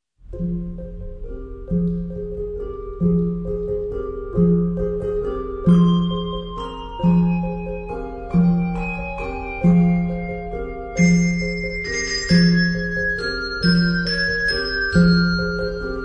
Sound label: Concert Bell Choir, We Three Kings